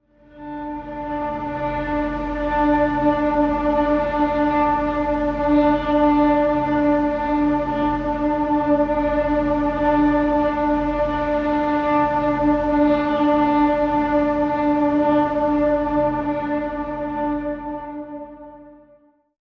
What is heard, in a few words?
Faint Bowed Mid Pad